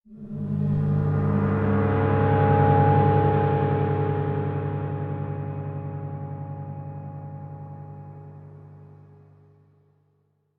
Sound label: Disturbed; Brand X Music; Brand X Music Catalogue - Editor's Essentials